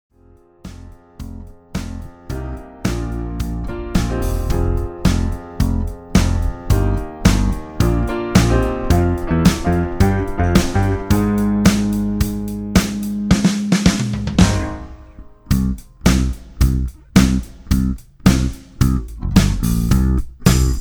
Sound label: w o Vocals Guitar